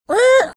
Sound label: making a goose like car pulling over sound